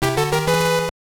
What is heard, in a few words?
Jingle, soundtrack; ultra golf 1991 gb